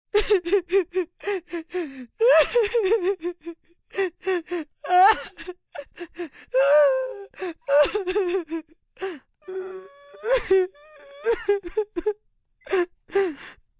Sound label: Crying Female, Female crying, long sobbing, pain, anguish (human voice)